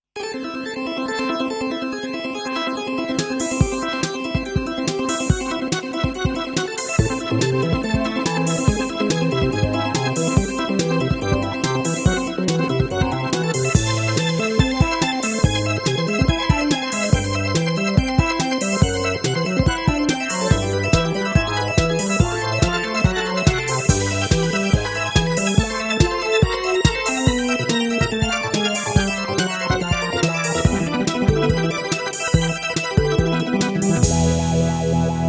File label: Rotator and Wah Bass; Vox Continental V